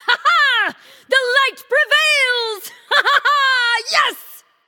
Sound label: "yay"; smite; nuwa